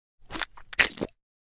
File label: Throat Slit, Sound effect of throat being slit with blade; Stabs and Slices (Sound Effects:Combat)